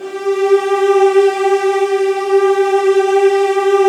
Strings G2 R; Virtuoso

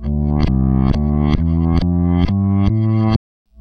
Backwards, Bass, Effected; Bass X

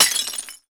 Broken Glass, household rhythm samples Hits

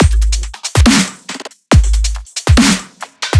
140 drum loops; Funktion; M8; Electronisounds

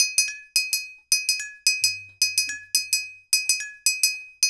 Vibrottles

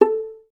VAS PIZ A3, Orchestra; Best Service; Peter Siedlaczek's Advanced Orchestra

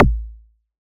Kick, Drums; Bitkits

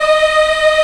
VOX African Mm; Roland LCDP11 Africa VOL-1

